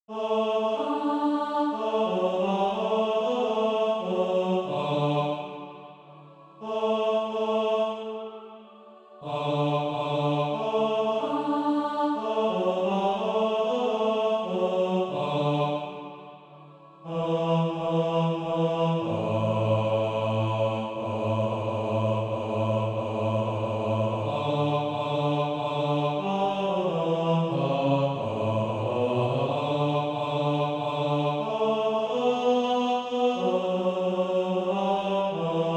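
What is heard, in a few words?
bass, Practise Music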